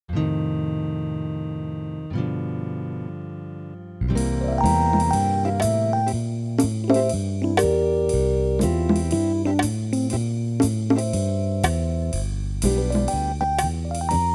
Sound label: MIDI blues